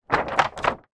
large poster movement; foley

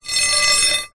foley metal rod scrape on ground

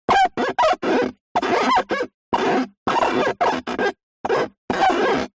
Handwriting Finger Fogged Glass Medium

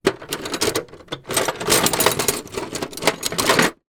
industrial tools rummage in metal tool box

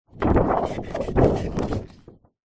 hospital xray sheet handling

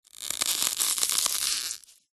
ice thin crack; zapsplat

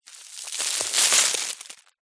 foley dirt fine dust handful drop sprinkle ground

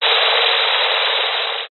science fiction radio signal interference burst

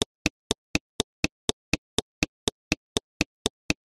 vehicles car indicator; zapsplat

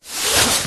foley garment bag fabric suit movement across bag

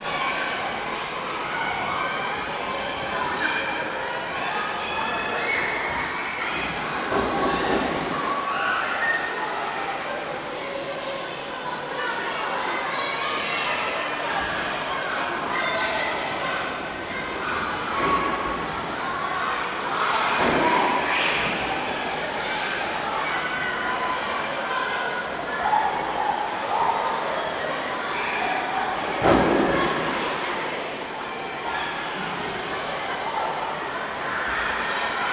public places swiming pool busy by boards

